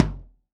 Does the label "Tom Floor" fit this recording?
Yes